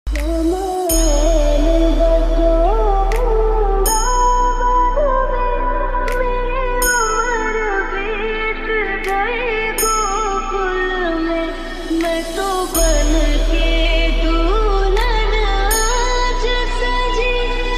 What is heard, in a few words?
ringtone slowed